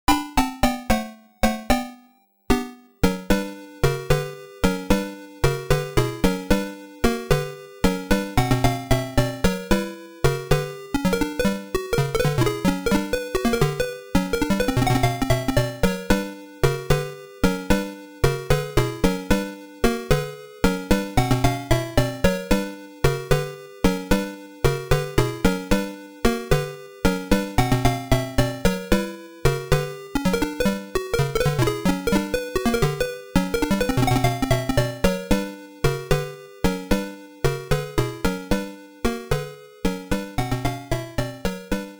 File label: Hungry (Food Empty); Xanadu